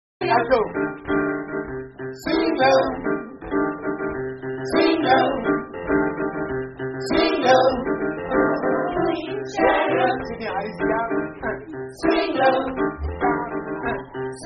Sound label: Swing low alto; Alti